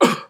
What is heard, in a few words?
grunt